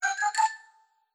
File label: Ladder